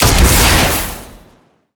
rocket launch

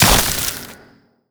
freeze

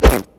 poop item throw

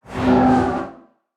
ball attack; ragmega

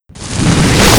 reverse explosion

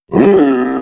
beast death

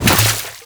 heart out

